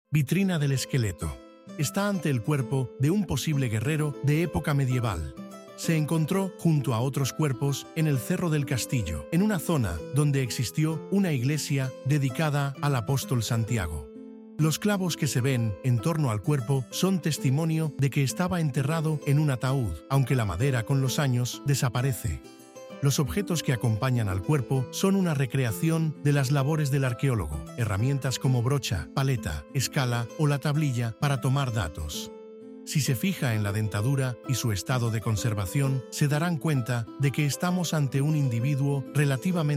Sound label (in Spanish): audioguia música